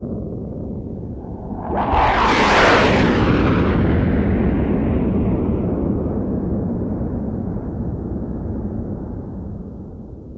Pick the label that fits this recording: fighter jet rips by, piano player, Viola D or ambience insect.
fighter jet rips by